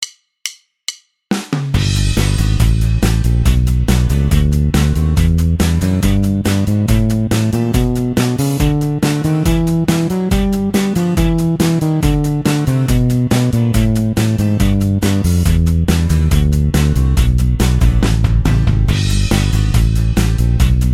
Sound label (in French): gammes